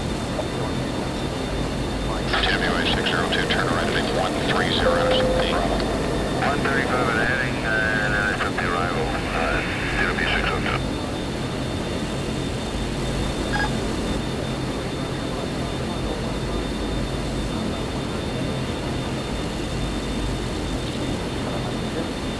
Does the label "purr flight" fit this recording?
No